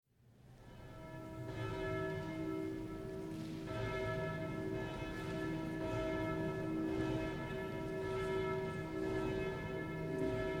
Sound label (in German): Glocken; Laurentius